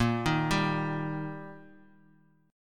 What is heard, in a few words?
Eb Major